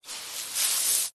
hose water spray heavy pressure onto grass single burst; household; zapsplat